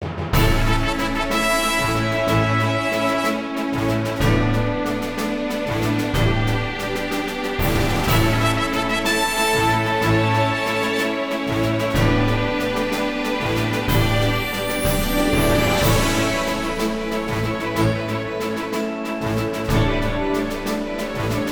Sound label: B Menu Event; dankira boys be dancing